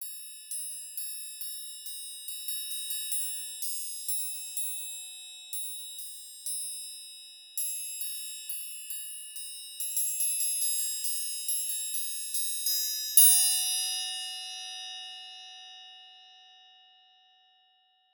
Trojkat

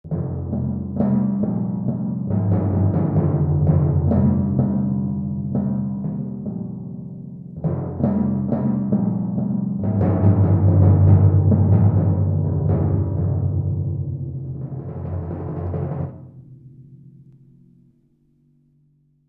Kotly

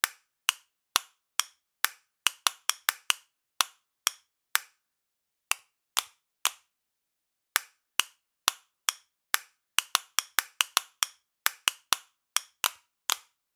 Kastaniety

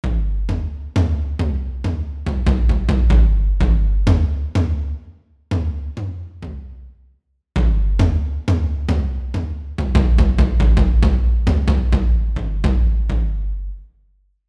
Tom tomy

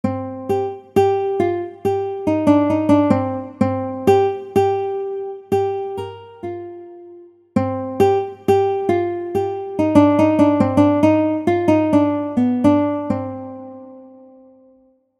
Lutnia

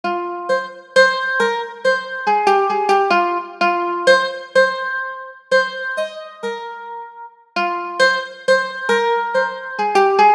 Mandolina

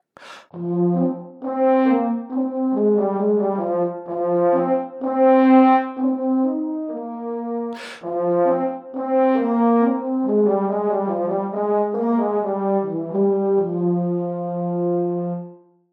Waltornia

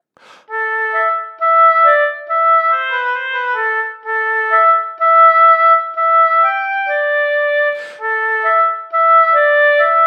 Oboj milosny

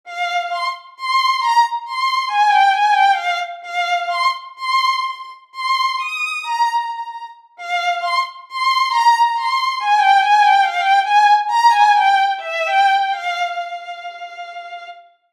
Skrzypce; Instrumentownik; chordofony

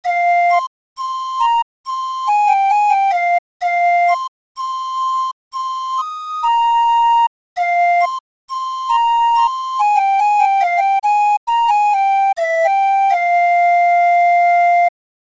Katarynka

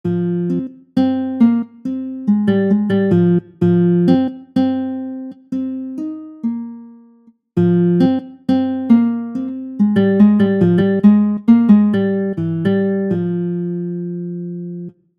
Gitara

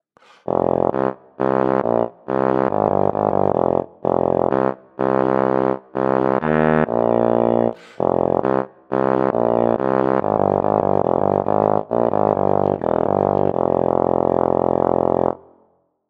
Suzafon

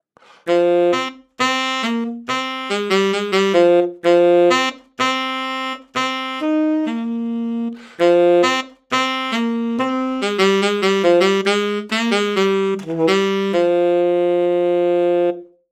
Saksofon tenorowy

